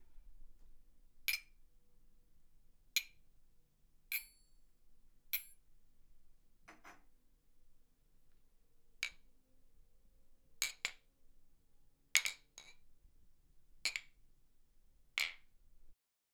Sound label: Glasses Clinking